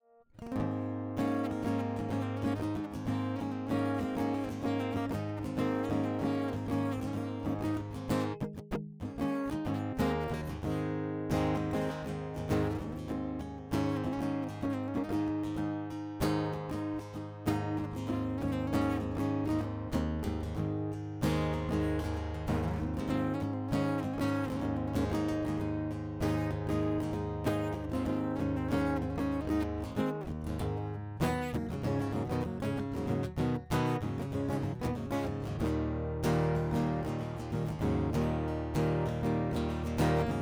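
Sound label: AC GTR DI, acoustic guitar; 97 Percent Fire Studio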